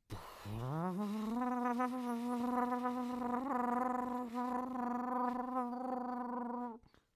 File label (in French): machine ventilation sérigraphie alumage